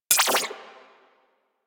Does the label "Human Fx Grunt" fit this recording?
No